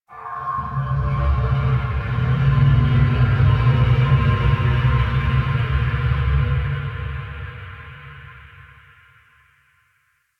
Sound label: Chimera Main Room Creature One Off; Ambience; Warframe; DE